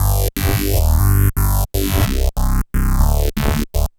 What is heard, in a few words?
Wurly Bass; Sonic Foundry (Sony Creative Software); The Electronic Music Manuscript Collection; Bass Foundations